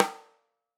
Tam Rim, drum

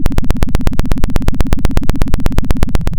pop, Sound of a helicopter

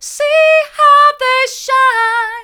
hard, vocal

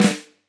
K1 close Flam, drum

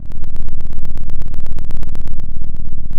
pop, Sound of an engine revving

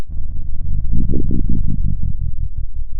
pop, Sound of a dinosaur

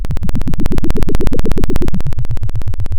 Sound of a plane taking off

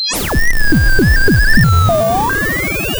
pop

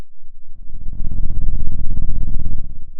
Sound of a dinosaur

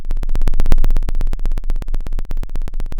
Sound of an engine revving

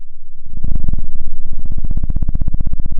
pop, Sound of a cat purring

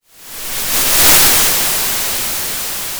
Sound of waves crashing on a shore

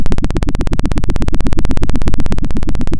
Sound of a helicopter

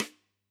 K1 close SdSt, drum

